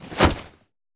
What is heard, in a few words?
melee stock; Tacz1.1.5+; RSWS 1903